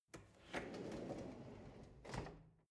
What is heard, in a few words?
drawer narrow open soft